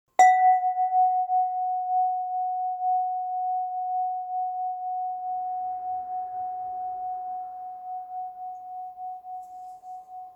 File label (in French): fréquence 741 Hz; Harmoniseur